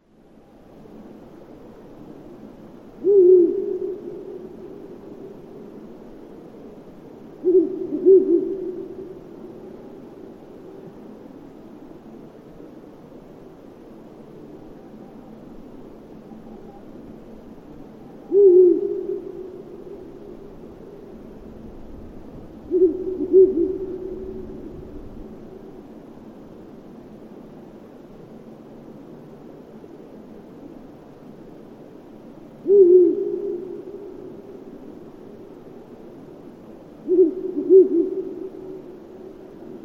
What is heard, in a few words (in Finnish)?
viirupollo, hallikainen